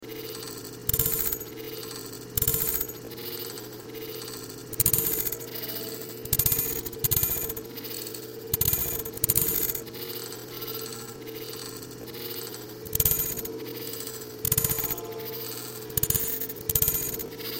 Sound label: binary message